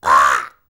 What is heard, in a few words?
TUV BIRD; Spectrasonics Vocal Planet; Jazz FX